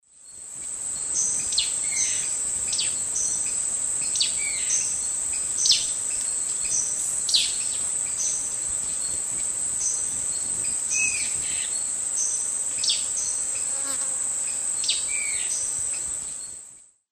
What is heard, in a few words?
Hypocnemoides melanopogon, calls; S. America; Eastern Lowlands